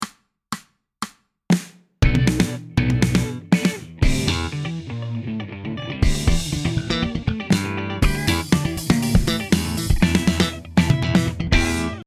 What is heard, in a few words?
Em 120 Rock